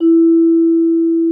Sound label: VIBP E4, VIBES; Xsample VOL-4